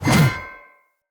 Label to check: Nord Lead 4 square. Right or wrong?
Wrong